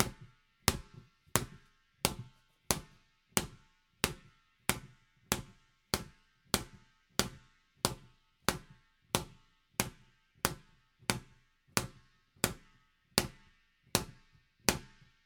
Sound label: Skippy ball Bouncing